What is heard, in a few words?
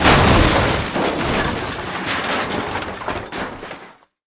metal break